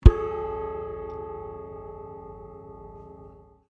ZITHER STRING HIT, Very old wooden zither or autoharp; Strings; Musical